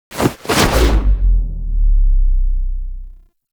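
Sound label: Archwing Cannon Jump; Rail Jack Archwing Canon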